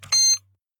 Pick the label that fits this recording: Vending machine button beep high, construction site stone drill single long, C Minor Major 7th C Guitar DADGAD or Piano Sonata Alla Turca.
Vending machine button beep high